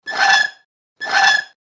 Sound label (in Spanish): Rechinamiento; Banco de Sonidos - Facultad de Art; Leitao - Cazanave - Capelluti - Suasnabar